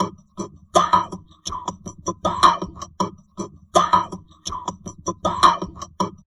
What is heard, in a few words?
TALK, Drum Loops Crazy Processed